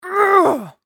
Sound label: Human Female Grunt; Grunts (Sound Effects:Voice)